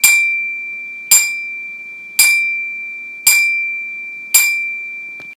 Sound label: Bell Ringer